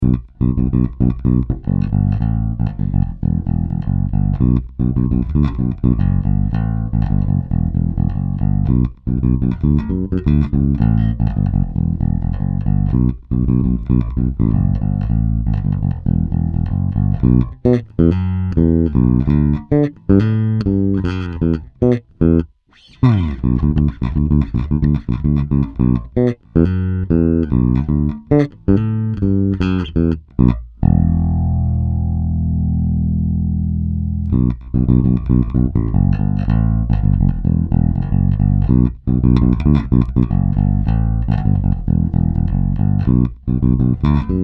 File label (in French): Passif Groove, basses; Sadowsky UV60